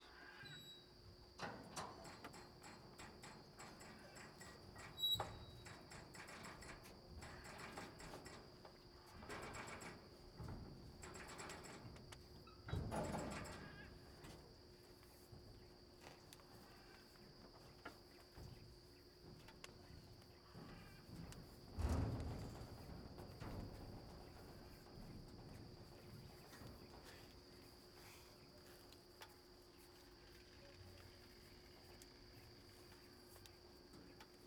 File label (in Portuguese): Motor de balsa dando partida com estalos, batidas e passaros longe; maquinas; canais-stereo